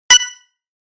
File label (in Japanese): ピッ音